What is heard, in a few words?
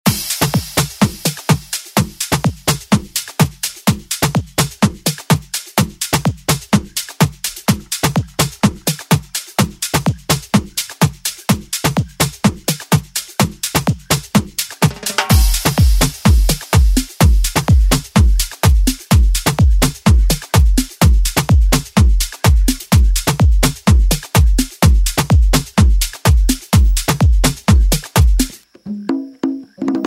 Afro House; DJ Mhark